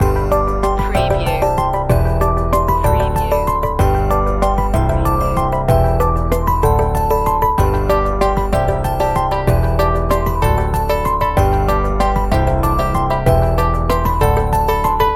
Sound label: light signals loop